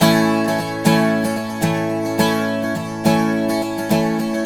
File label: Blues Guitar Samples